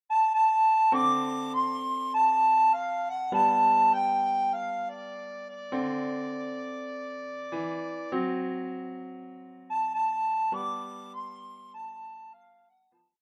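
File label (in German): Sopranblockflöte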